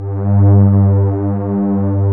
CHORUS STRI; E-MU Formula 4000 Series Vol. 2

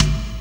Ambient Click, One Shot Drums

